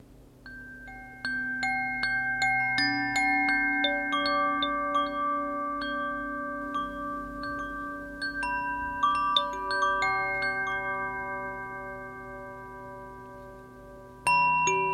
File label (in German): klangspiel koshi ignis